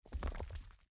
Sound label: Sharp Rock; Blind Quest - The Frost Demon